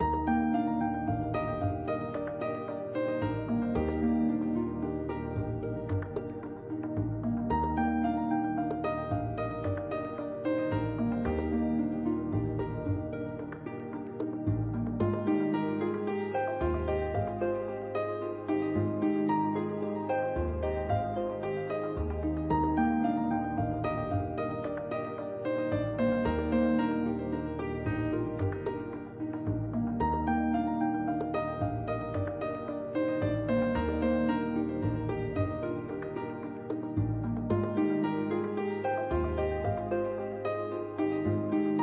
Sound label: tower stairs down; bgm